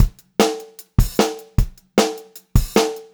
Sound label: Pop-Rock Drumloops; USB Soundscan vol.37; AKAI; 90 s Sample CDs; 152 POP